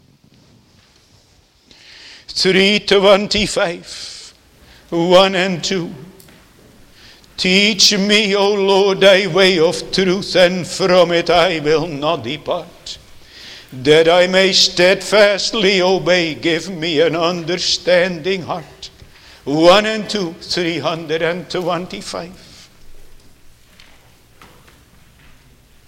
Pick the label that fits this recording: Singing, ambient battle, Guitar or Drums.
Singing